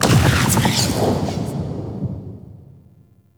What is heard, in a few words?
Portal Touch; Fibonacci; Warframe; Entrati